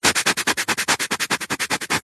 foley abrasive foam rub clean or sand concrete fast